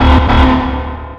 gate klaxon; mannhattan

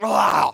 zombie grossed out; mvm forever

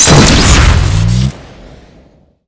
phazer beam shoot crit

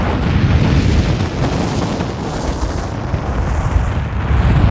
fireball impact

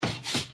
pogo zombie; mvm forever

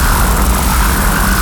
megalaser fire loop

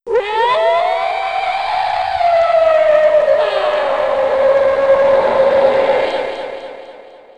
brute boss alert; interloper

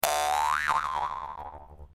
vaulter boing; mvm forever

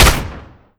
lmg shoot

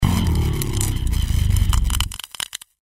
zombie frozen; mvm forever

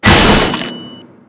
charge collide robo

